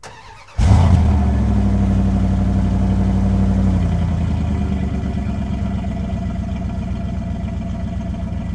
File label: Truck Dakota Start Idle Loop; Trucks